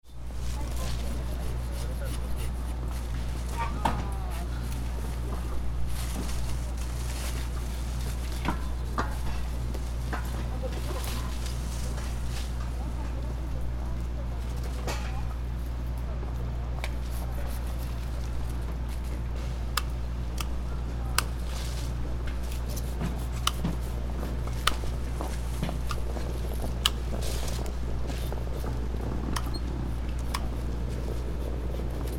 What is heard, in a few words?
west gate